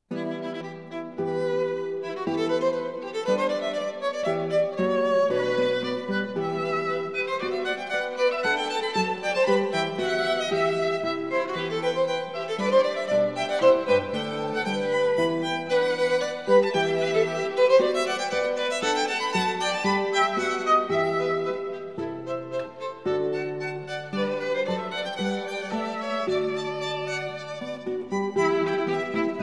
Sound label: Trio Sonata in E minor Allegro